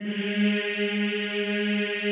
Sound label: EEH G#2, Extended Classical Choir; Best Service